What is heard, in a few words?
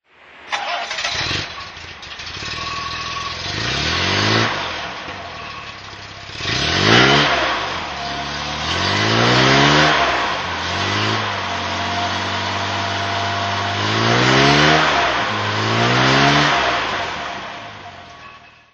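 daimler sovereign throttle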